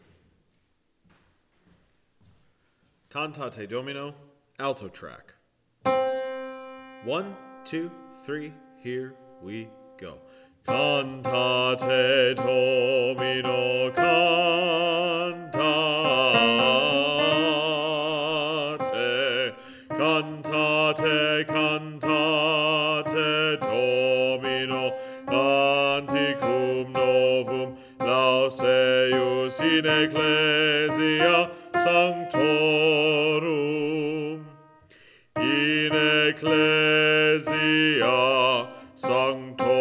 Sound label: Alto, choir; Cantate Domino; Pittoni